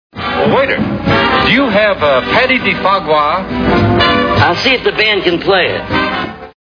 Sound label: band